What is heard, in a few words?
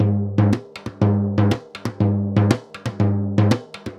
SURDO, DRUMS SAMBA